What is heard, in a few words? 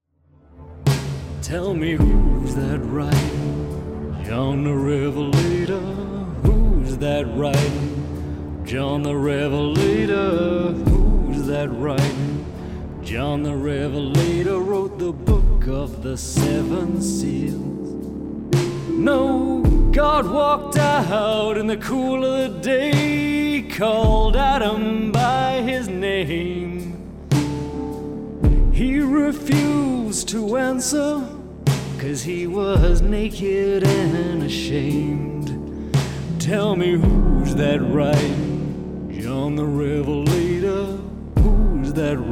Music; JOHN THE REVELATOR COVER